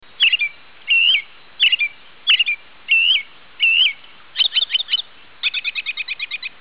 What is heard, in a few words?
Turdus migratorius; Chordata; LandBirds